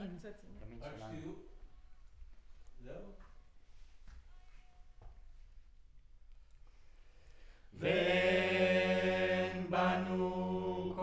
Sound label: Center u47, Choir